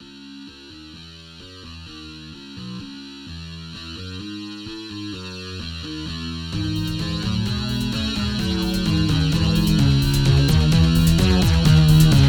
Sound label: synth only; unununium; willow; nockmaar